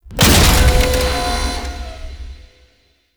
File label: Galleon Platform Start; Warframe; Grineer Galleon; Vor Galleon Fight